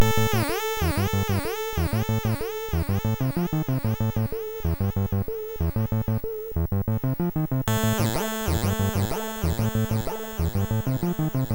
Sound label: Mellow Bite sid MOS6581R2